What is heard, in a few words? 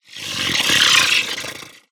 Glyphid Exploder Expand